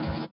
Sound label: SWUSH; Spectrasonics; Metamorphosis